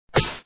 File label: open; Magic-Tower